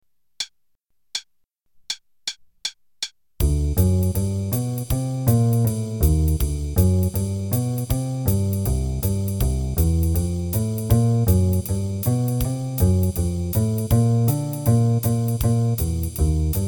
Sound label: Play-a-long ii-V-I's over 2 measures, qn=160